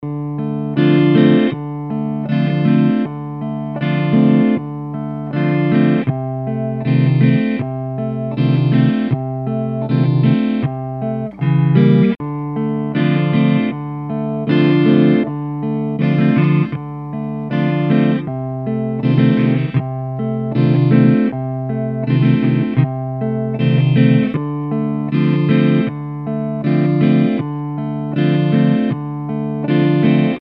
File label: Tap Swing